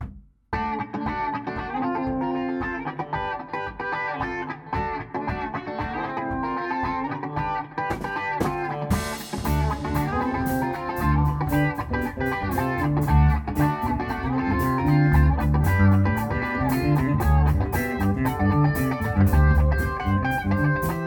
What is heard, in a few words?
Funky Part